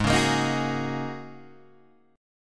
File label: G sharp 7th Suspended 2nd Suspended 4th G sharp Guitar Open G